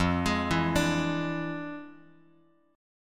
F Minor Major 13th, Bass Standard; arpeggiated; JGuitar

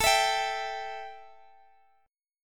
E Minor Add 11th A; Emadd11/A; strummed; madd11:1,b3,(5),11; x,x,x,14,17,15

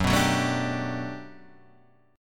G Major 9th, F sharp

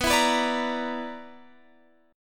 B-Minor Major 7th Double Flat 5th, Guitar Open F; BmM7bb5; strummed; x,18,16,18,17,17

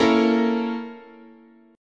Asharp Minor Major 7th Flat 5th, chord, guitar

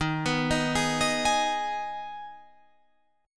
D sharp Suspended 4th Sharp 5th, Guitar, Open F; arpeggiated

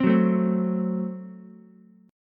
F sharp Diminished F sharp, Ukulele; F#dim strummed

